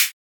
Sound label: Hat